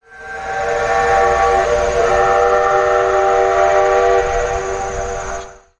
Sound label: horn